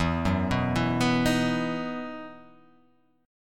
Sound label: E Major 7th Suspended 2nd; EM7sus2 arpeggiated